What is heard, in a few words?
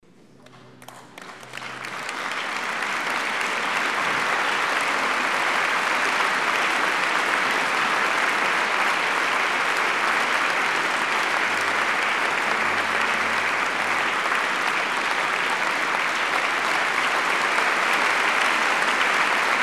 applause concert hall